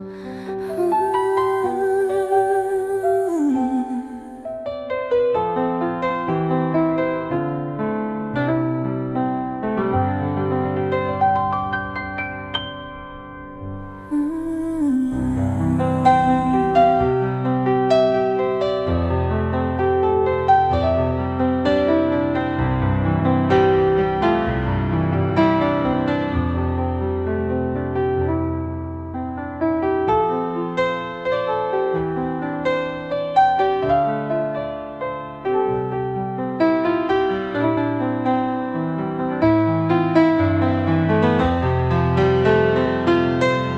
Kolekcja nastrojowych kompozycji instrumentalnych; Solitude in Duet

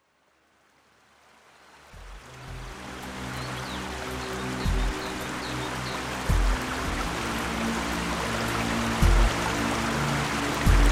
Relaksacyjna muzyka z odgłosami górskiego potoku; harmonia natury

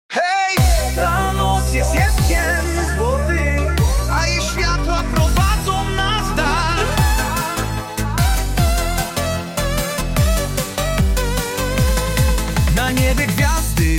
disco polo

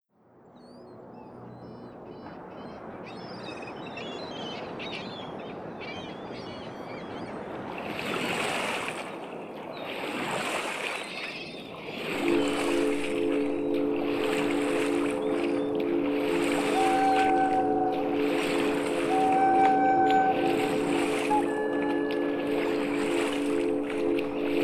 muzyczny relaks 432 Hz; plaza w deszczu

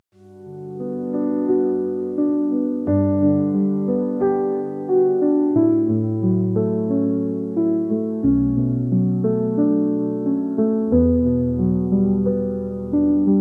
Kolekcja instrumentalnych utworów na pianinie; silent piano

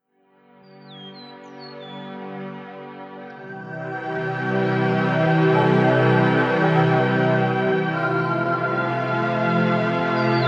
muzyczny miks relaksacyjny kolysanka 432 Hz

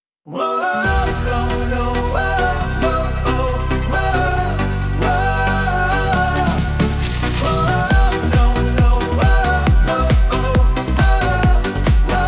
disco polo

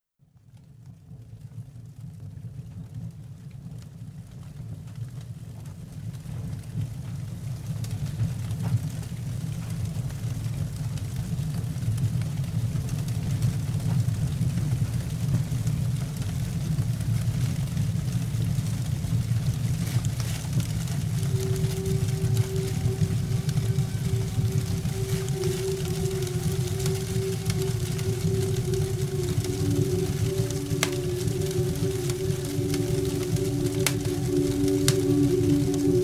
przy kominku, kominek 432 hz

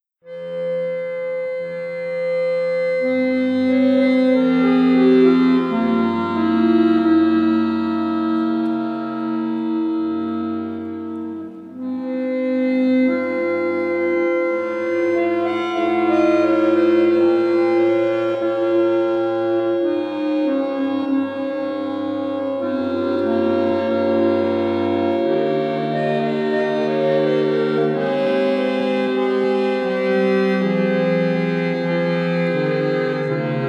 duet akordeonowy 432 hz

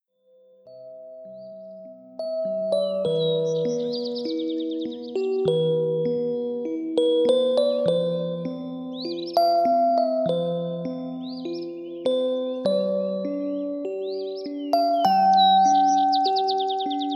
muzyczny miks relaksacyjny 432 Hz

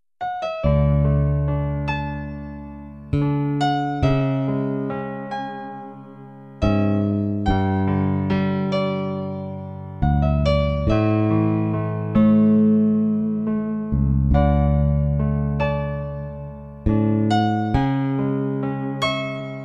taneczny relaks 432 hz

